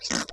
mud out; Group Mapping Project

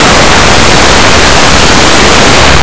freeze; Blue Heat

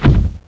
din; BERMUDA

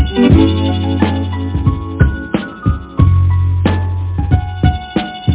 cream loop; Play It 2

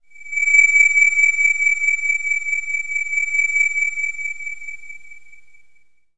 beacon, space; Select Soft Publishing Stock Clips Multimedia Clips

